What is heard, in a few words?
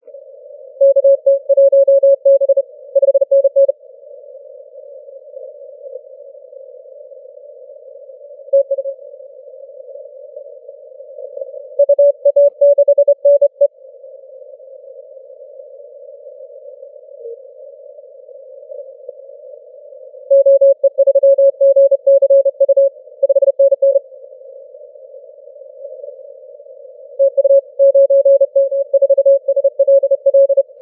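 15m continuous wave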